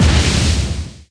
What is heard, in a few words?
Cannon